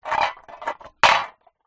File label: drink can empty movement roll concrete ground